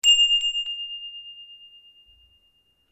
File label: bell handbell priest japanese ring multiple